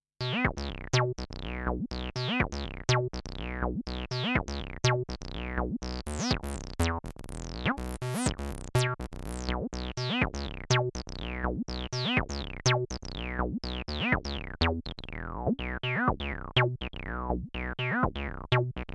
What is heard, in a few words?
x0xb0x Square